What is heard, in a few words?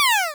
shot; protectTheRoom